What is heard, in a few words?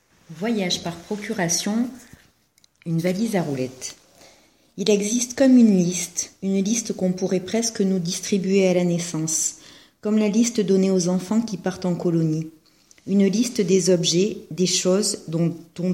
Vocal